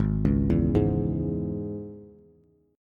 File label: Ab Suspended 4th Sharp 5th Bass; arpeggiated; JGuitar